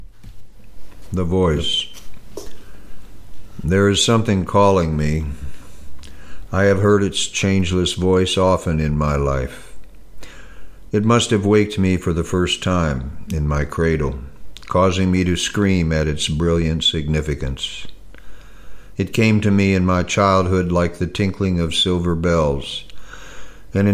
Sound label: Carillon; The Voice